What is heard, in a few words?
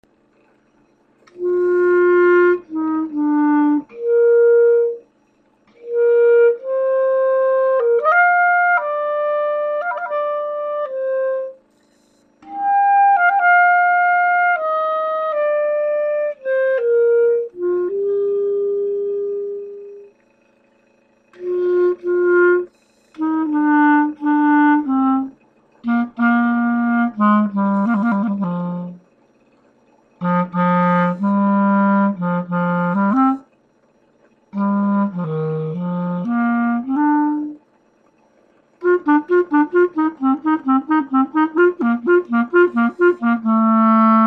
clarinet doodle